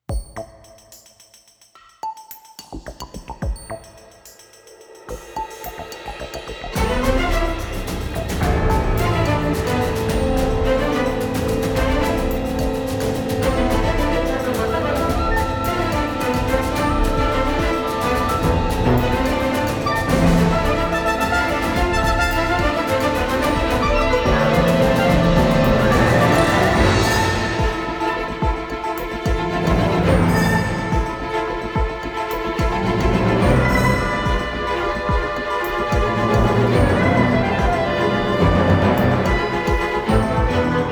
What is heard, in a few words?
Forest